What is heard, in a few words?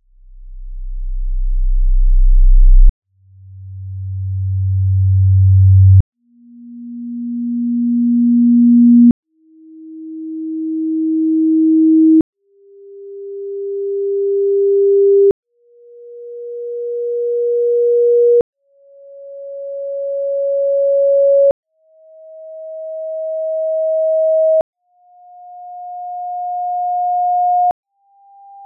test signal tubeamp